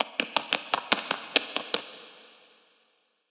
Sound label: Claws